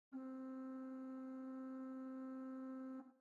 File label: Physics Flute; organteq2